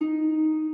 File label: harp